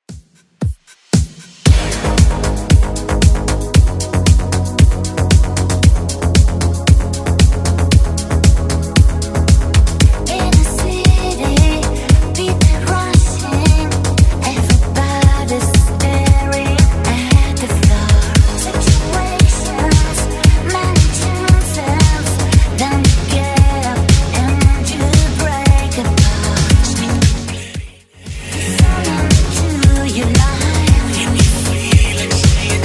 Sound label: 80s 115bpm; Redrum